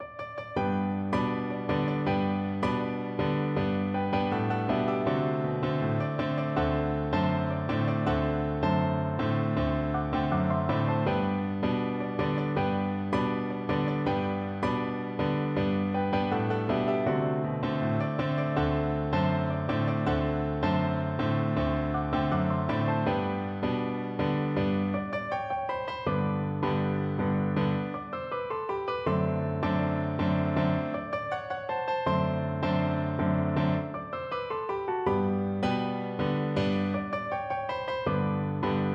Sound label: la cucaracha piano duet